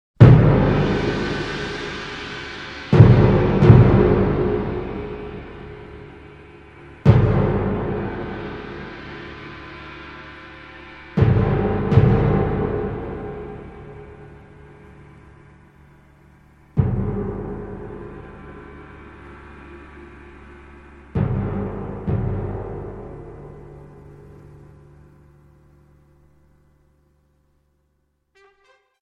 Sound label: fanfare